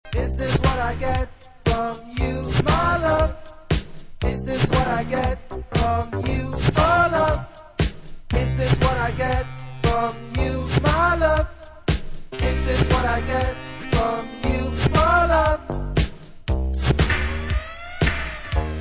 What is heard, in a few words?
vocal; Disco